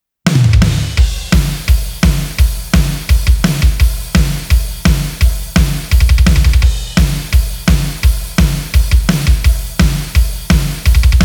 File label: HEAVY DRUMS ONLY